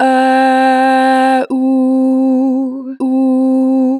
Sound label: Ah Ooo 2 bar vib, Vocals, Natural Vibrato; Harmor Vocals